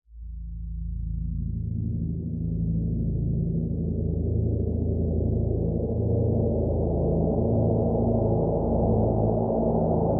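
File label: Drone Wind Farm A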